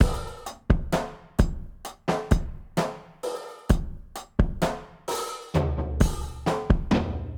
130 Kit Drums

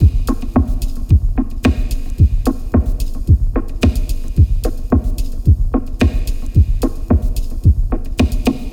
110 drum loop held

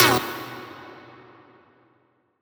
Synth One Shot E

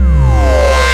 Hard Bass Tap C

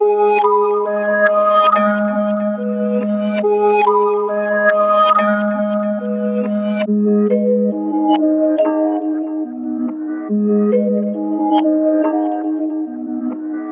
Trap Synth Melody Loop 140BPM G-m

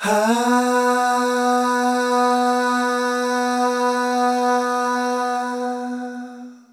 vocal one shot note B

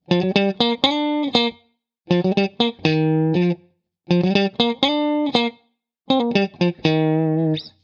Guitar Loops Funk 70 Cm Dry

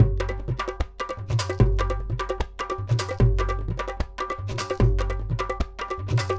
150 Mixed Perc Set

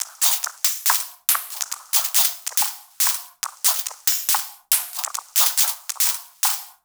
140 Fx Texture

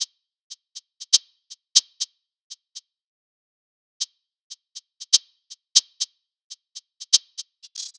Drum Loop Top 120

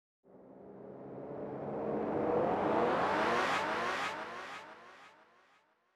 Sfx 120BPM

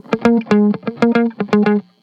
118bpm Guitar Loop Funky A m; Orange

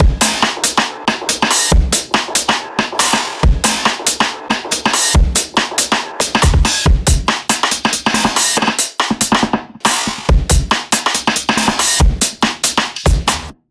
Resampled Breaks

